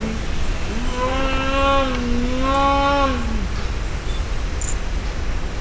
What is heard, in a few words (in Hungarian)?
távolról, leopárd, jaguár; szegedi zoo 2011